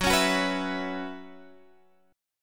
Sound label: B Suspended 2nd Suspended 4th Gb